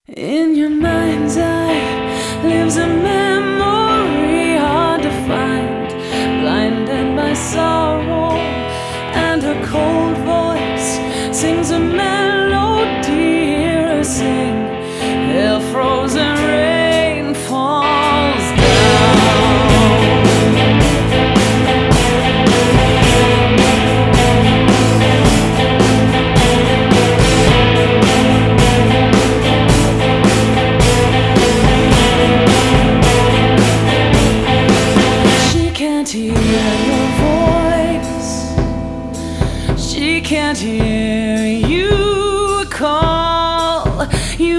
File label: Hell Frozen Rain (vocals); Silent Hill Shattered Memories